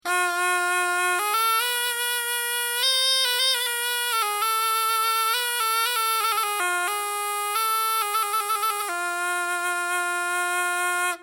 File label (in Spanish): albogue; UnidadQuijote